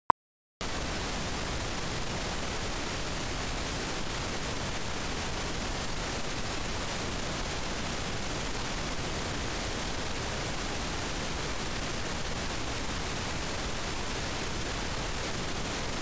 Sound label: loudness step sequence